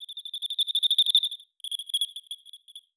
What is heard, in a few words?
emma field cricket ring synth